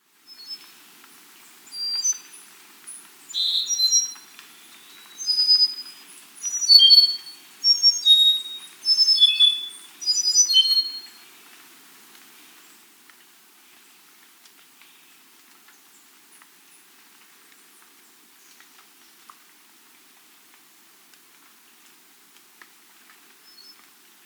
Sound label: Andean Solitaire (Myadestes Ralloides), Andean Birds; S. America